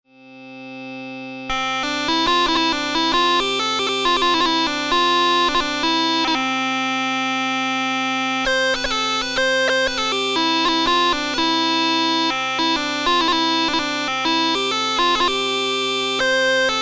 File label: huemmelchen c dur, bagpipe